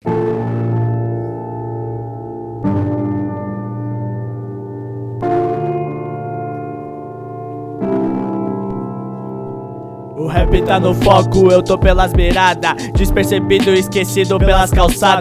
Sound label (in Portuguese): interludio